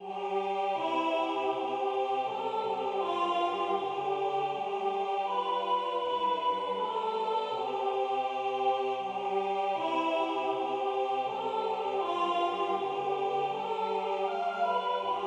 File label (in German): Uf em Baenkli vor em Huisli Sopran